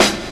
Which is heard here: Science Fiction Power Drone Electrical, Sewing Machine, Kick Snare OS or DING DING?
Kick Snare OS